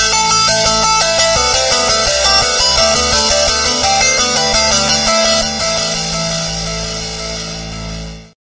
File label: rock guitar ringtone